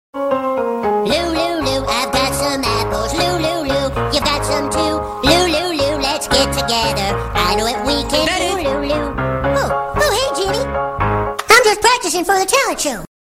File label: lu lu lu funny ringtone